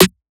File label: Trap Snare; Hard hitting and production-ready snares for Hip Hop and Trap; synthstudiosind; SS Trap-Snare Vol 1